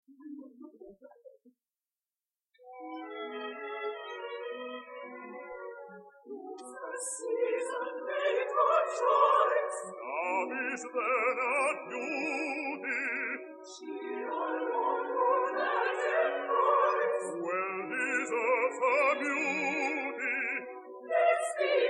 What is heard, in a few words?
air